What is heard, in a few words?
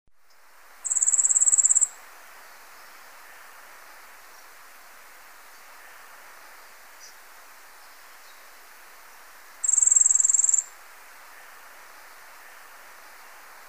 Yellow faced Grassquit Tiaris olivaceus pusilla